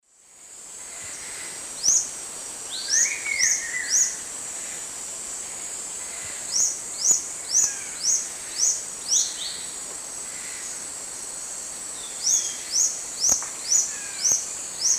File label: Epinecrophylla erythrura, call and song; S. America; Eastern Lowlands